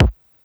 Bass Drum Punch Lo; Lost Tapes From Mars

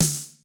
Snare, Yamaha RY20

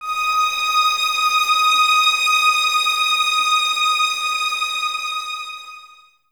Violins D#6, String Ensembles; Miroslav Vitous; 11 Violins

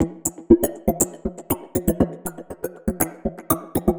Rhythmic (120BPM); musicradar

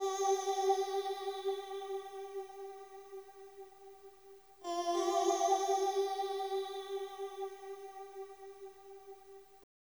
SYN, Hip Hop and R&B; Best Service ProSamples vol.02; AKAI

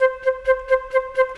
Cinematic Winds; Sonic Foundry (Sony Creative Software); Orchestral Series VOL-3; Cinematic 21

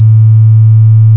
S-LEAD; AKAI S-Series CD-ROM Sound Library VOL-8

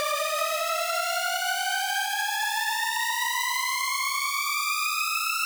riser; M8; riser sfx

